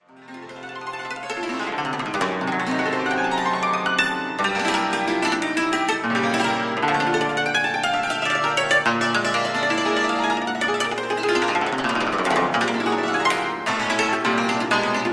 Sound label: csardas cymbalom; Concert